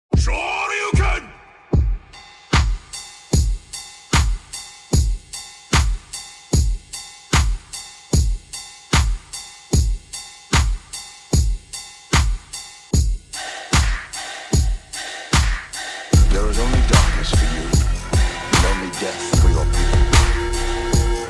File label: dubstep; dubstep24h